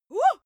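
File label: female attack wuh, screams; schreie; menschen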